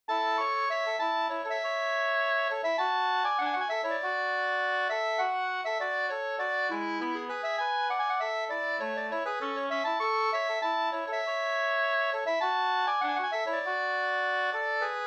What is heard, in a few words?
polka polkett; fr Bingsjo Da 2st